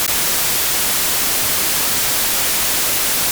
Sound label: SE NOISE; NOISEB; gnosia switch gamerip 2021